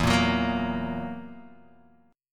E Suspended 2nd Flat 5th E down Guitar Open D

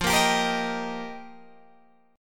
F 7th Suspended 2nd Suspended 4th, Guitar Open G

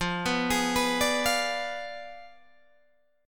F Diminished 7th F down Guitar Open G

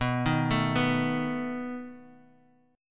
A Suspended 2nd Flat 5th B, guitar

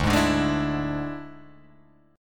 D Suspended 2nd Flat 5th D Guitar Open G

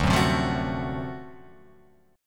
D 7th Sharp 9th D down Guitar Open F; strummed; JGuitar

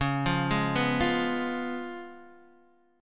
C sharp 7th C sharp, Guitar Open D

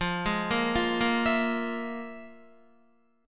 F Minor Major 7th Double Flat 5th, Guitar; FmM7bb5 arpeggiated; JGuitar

